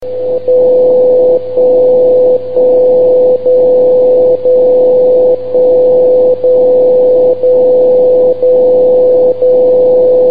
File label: signal 77 Hz